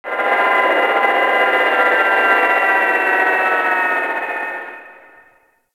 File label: Landscape Orb Crater Drone, Ambience; Warframe; Venus Open World; One Offs